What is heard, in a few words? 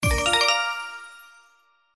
SFX SCATTER WIN; slots; Lucky Wok